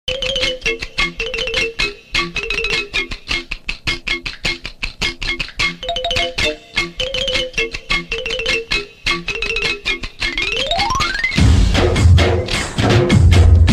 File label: telugu bgm ringtone